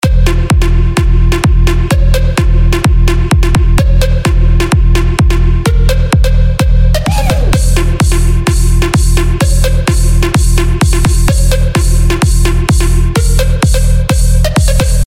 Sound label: EDM